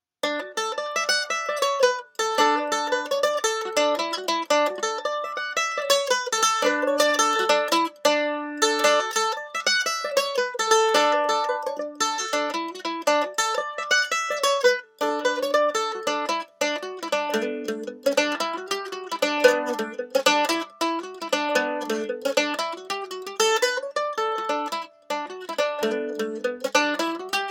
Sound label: Mandolin Pick Comparison